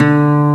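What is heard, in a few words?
acoustic guitar D2; EL-GUT G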